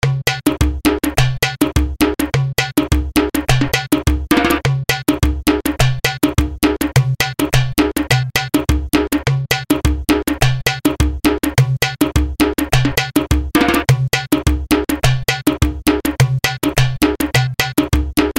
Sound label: pad bandar